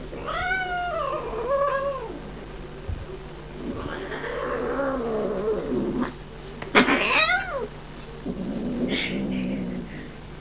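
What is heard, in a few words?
Felis catus aggressive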